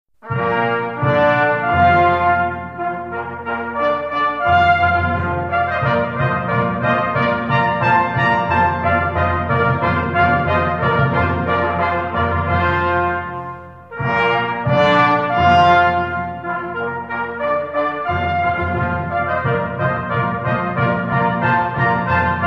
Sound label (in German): Aufzug